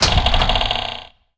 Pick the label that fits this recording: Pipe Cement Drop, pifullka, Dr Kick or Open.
Open